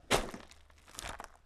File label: Rocky Step, outdoor, Footsteps